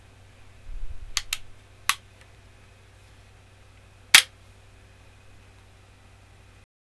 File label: Rifle Lock; forlorn